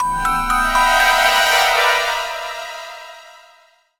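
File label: Jingle Level Fail; Monster House; ds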